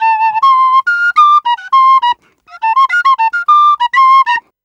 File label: Penny 103bpm F, Penny Whistle